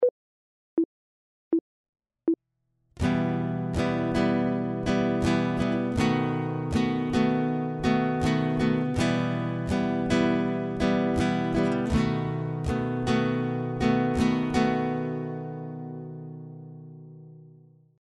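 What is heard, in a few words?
chord, guitar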